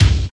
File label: raw steel kick drum c key